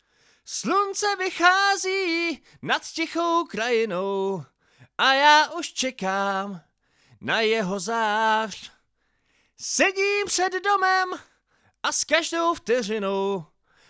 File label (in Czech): Vokál solo Shure 57